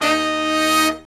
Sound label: BRS, Brass Sections, R&R Horns; Roland LCDP06 Brass Sections